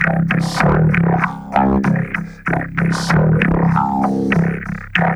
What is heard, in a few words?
BASS, Funky Ass Loops